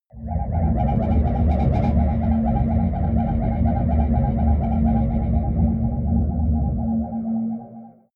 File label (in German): flying ship dark hallway, raumschiff